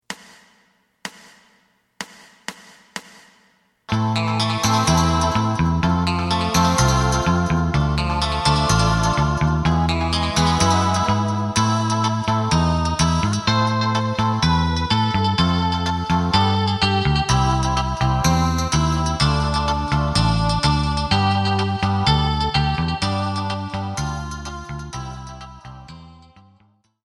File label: drum